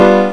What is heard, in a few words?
piano G7; Atari Mega Archive - Volume 1; music